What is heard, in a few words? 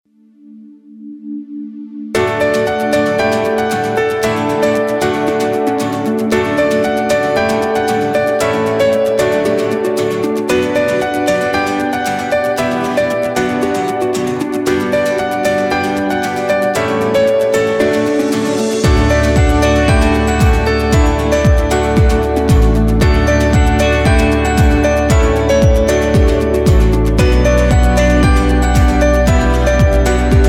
Corporate